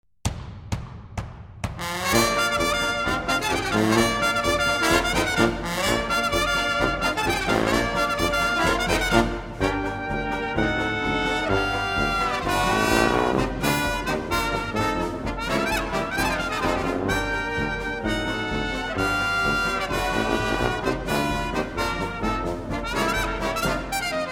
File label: Brass Ensembles